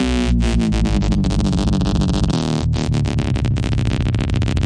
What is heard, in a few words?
nasty drop bassline; Basses